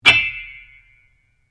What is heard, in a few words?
PIANO UNTUNED CLUSTER, Baby grand piano left to fall out of tune; Pianos (Sound Effects:Musical)